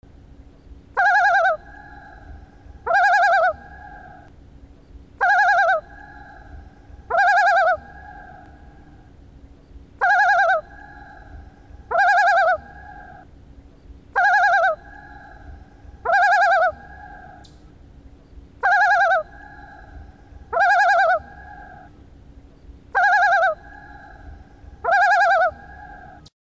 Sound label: tremolo; UWEXLakes; convention 2016; workshops